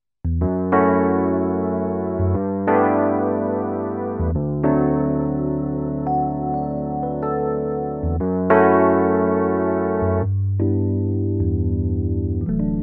Roland FP 60 Wurlitzer

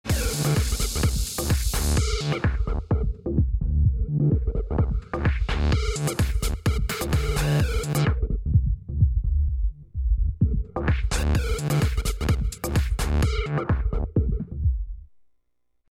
VCF LP Wild

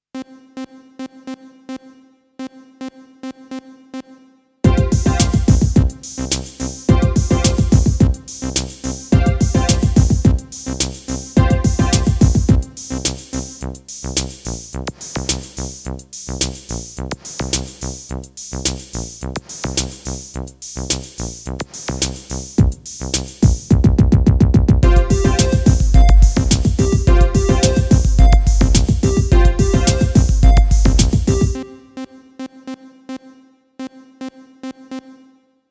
Vintg Techno Sequenz

